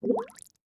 Liquid Water Bubble Surfacing And Popping Multiple